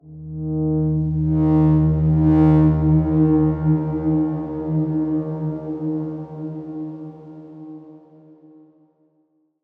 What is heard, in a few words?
Darkswarm D#2 f; HSS Ableton Pack1